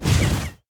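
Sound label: dragons enemy shadow basic cloak; sfx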